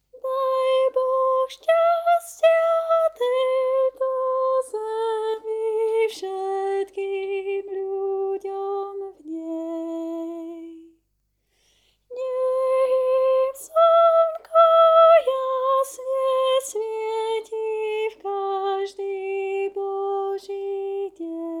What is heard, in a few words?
Tenor; Daj Boh stastia